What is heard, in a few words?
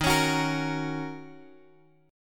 Eb Minor 7th, down, Guitar, Drop D; strummed